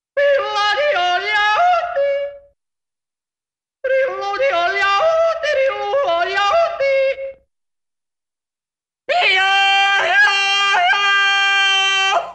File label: Man Yodeling, Cartoon; Hollywood Edge; Cartoon Trax